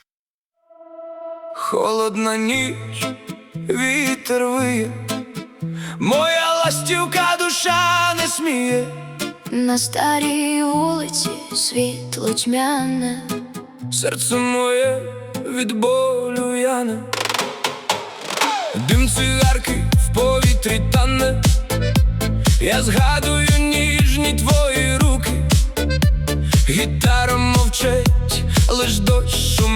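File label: Ukrainian music for the car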